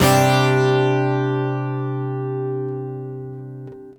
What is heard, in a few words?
Acoustic, акустика